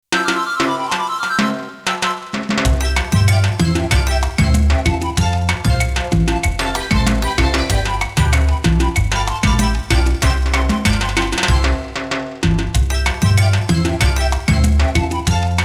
Rumba; Pokemon Gotta Catch the Sound; Snapshot

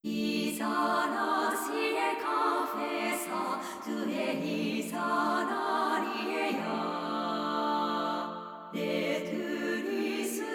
vocal; Xenoblade Chronicles 3

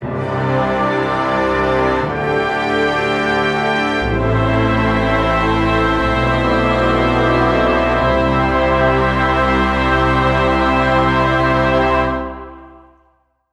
Special Fanfare; Chronicle Mode; Dariusburst Chronicle Saviours; PS Vita; PS4

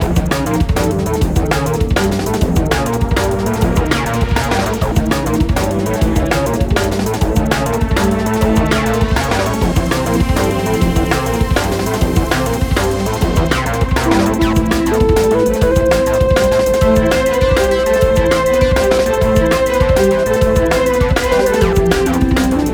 breakbeat loop 100bpm; chicory a colorful tale; ps4

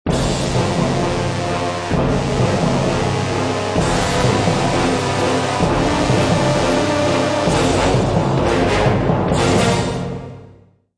Medieval Agitated; Civilization Revolution; Xbox

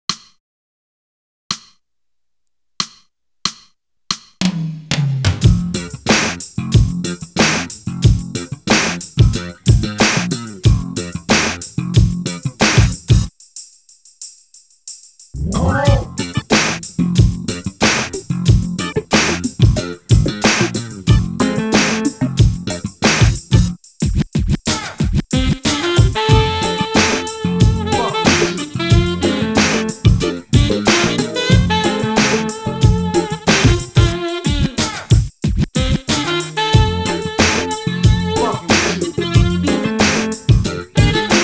Funny Funky; EZ2DJ The 1st Tracks

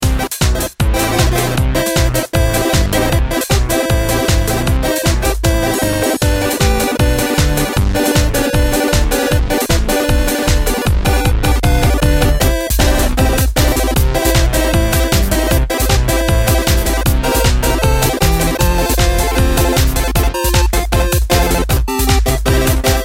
electronic; 8 bit video game ringtones; Android game music

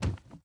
Hiding Spot Enter Body; The Inn

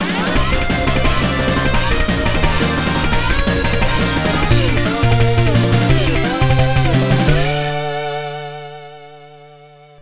All Clear; Mega Man 4: The Sequel Wars Episode Red; Genesis

